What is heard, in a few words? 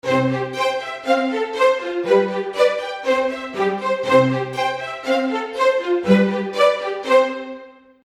Seamless Music Loop Strings; Musical